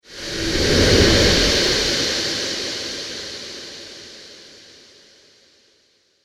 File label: Ghost Whoosh; Ghost Sound Effects; horror